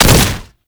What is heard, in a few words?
Arcade Automata Impact; DeepArchimedia